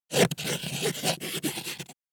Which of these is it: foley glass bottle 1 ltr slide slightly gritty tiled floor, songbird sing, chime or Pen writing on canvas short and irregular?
Pen writing on canvas short and irregular